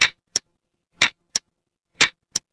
mechanical clock tick

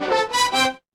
feedback level up

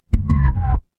feedback fail

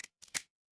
cartoon stretch

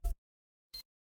ui beep

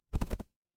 animal wing flap

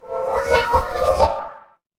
magic scifi teleport

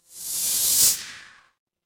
transition sweep

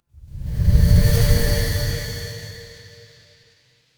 magic scifi portal

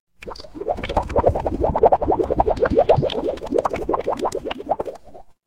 water bubble

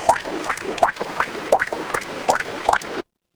water drip

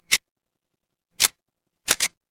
office camera

